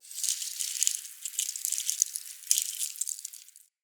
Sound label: Forest Dry Leave Move; Ambience; Midrath Island